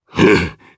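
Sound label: Vox Landing, Japanese; voices; heroes; Phillop; kingsraid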